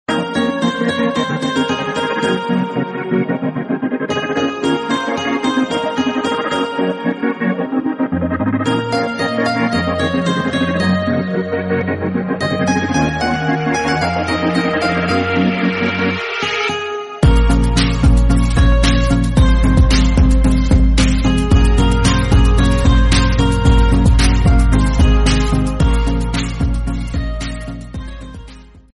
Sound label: romantic Bollywood song instrumental tone ringtone